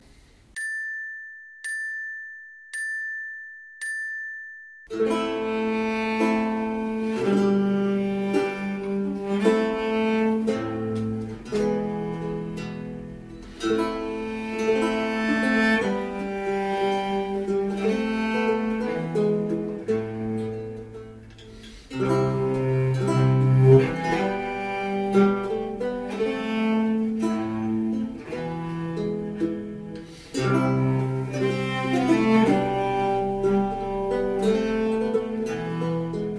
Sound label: Greensleeves click